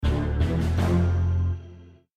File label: battle in; Dungeon Crawlers Metal